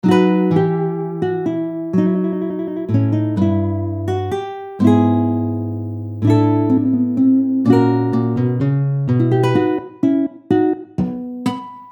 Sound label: Lick, guitar; Guitar Club Febbraio 2020